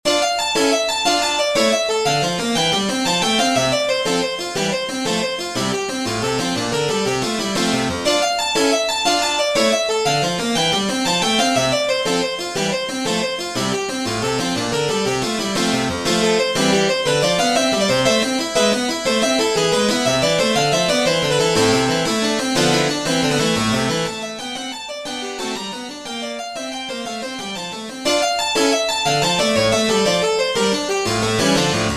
keyboard; keyboard suite D minor

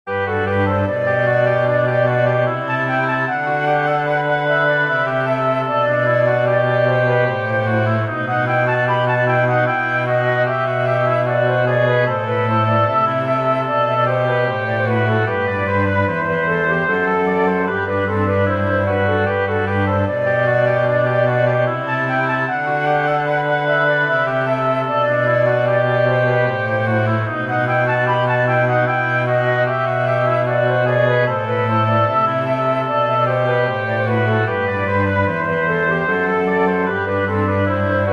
variation for flute oboe cello; bach johann sebastian allein gott der sei ehr

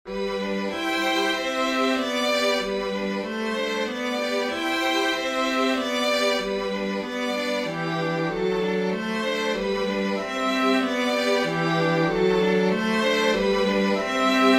for string quartet; tchaikovsky piotr ilitch; mama

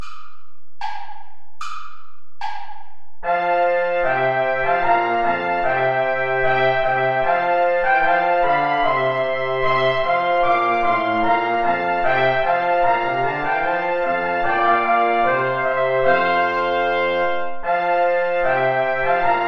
play along without trombone

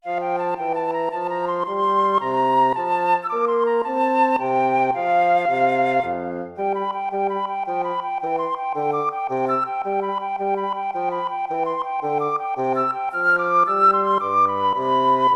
minuet; mozart wolfgang amadeus

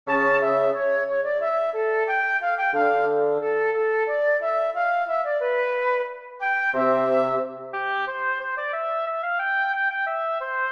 recitative chorus for woodwinds strings; Johann Sebastian Bach; und trat ihm eine magd

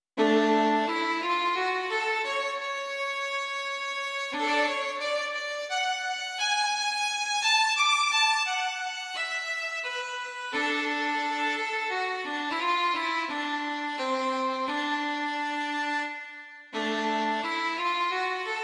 intermezzi larghetto

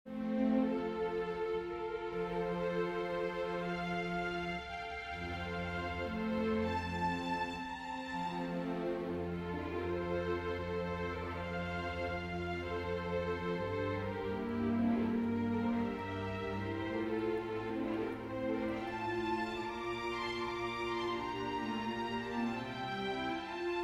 for english horn strings; haendel georg friedrich; jehova crowned with glory bright

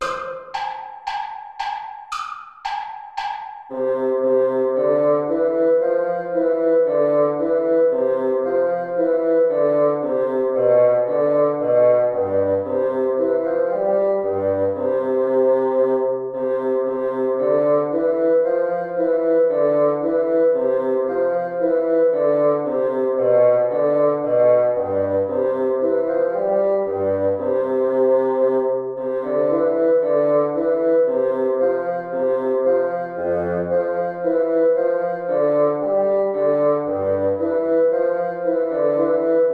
air bassoon play along

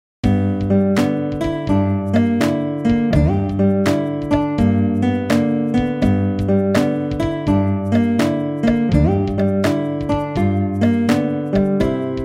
blues; gibson gorden; narcissus daffodil blues

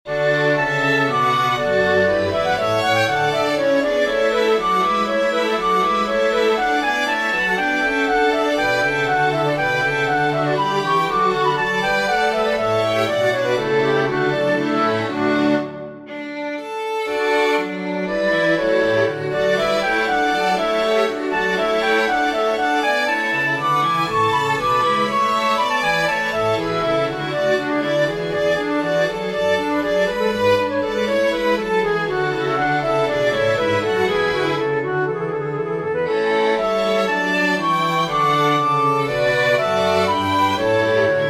aria for flute string; haendel georg friedrich; presti omai legizia terra from giulio cesare

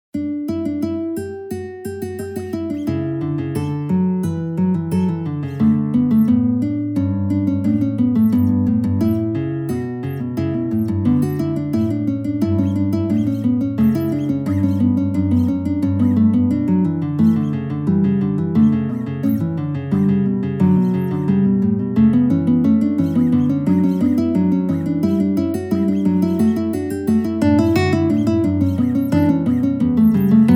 invention major

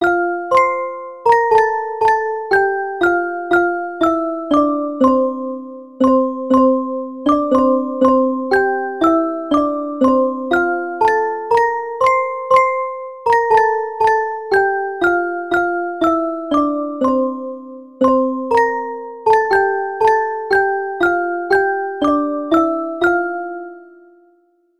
children handbells; handbells arrangments

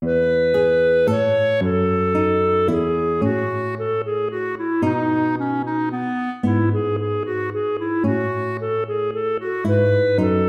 minuet major

